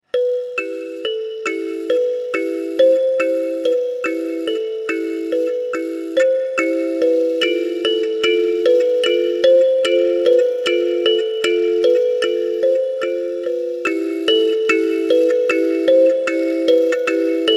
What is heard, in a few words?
Am Karimba